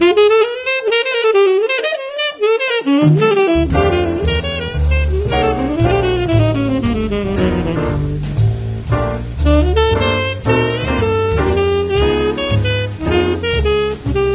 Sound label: Cool n Jazzy